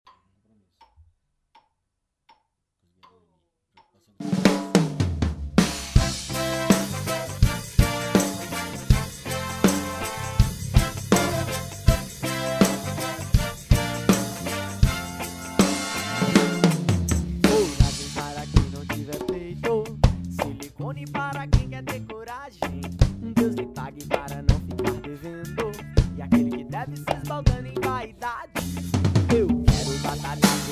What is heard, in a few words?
reggae